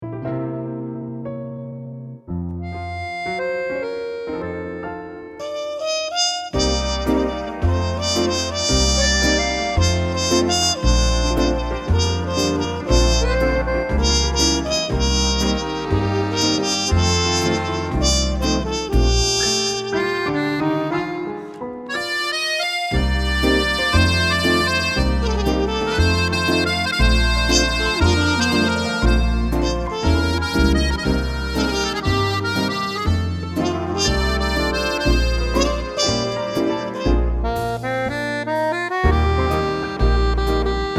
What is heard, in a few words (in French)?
trompette accordeon